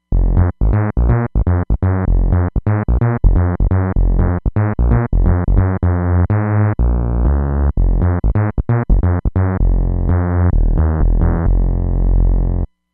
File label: Detune Bass; Roland Fantom XR